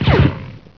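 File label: ricochet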